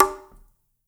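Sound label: conga, right; Spectrasonics; Liquid Grooves 1 & 2; CONGAS+BGOS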